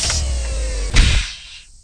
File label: Fsdoor